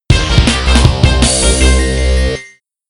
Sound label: Fanfare- Car Bonus; lego racers 2